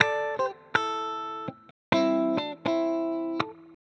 ELEC GUIT ETHNO 125 A, Guitar; eJay

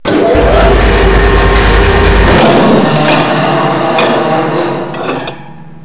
big drill; REDNECK; RAMPAGER

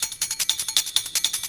PERCLOOP D+B 160, Drum+Bass Loop; eJay

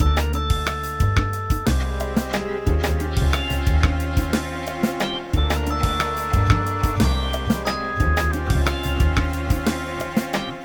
bombo groove; bombogroove 12

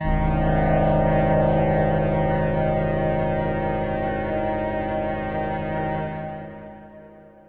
synth pad ambient 160 C; Sphere

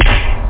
bdrum

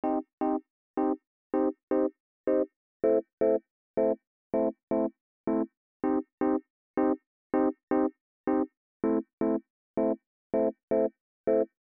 el piano; bombogroove

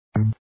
pellet Sound; save princess minnie

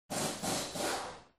impact

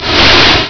Immolation; Ultima III v1.3; Ultima Sounds

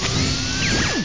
Swingin; Carmageddon

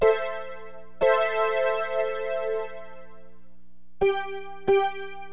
VIBE, Keyboards; HipHop; LiveAct Pool 1

